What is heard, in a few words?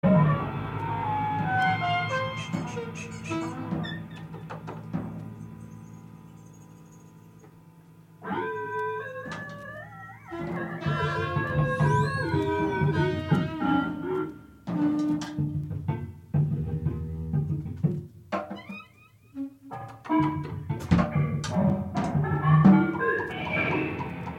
cool belgium tune ringtone